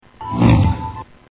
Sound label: Hmm